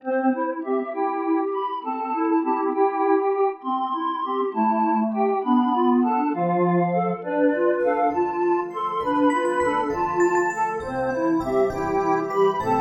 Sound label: Funfair Mood